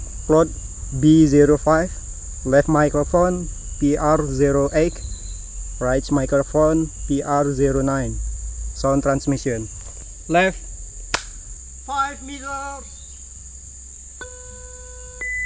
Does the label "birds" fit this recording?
Yes